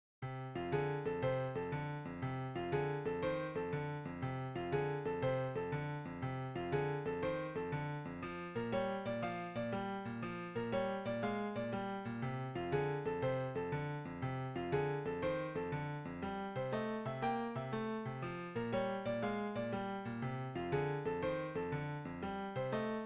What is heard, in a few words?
Simple Blues In F; 16Blues